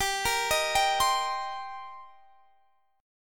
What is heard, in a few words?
D sharp 6th, G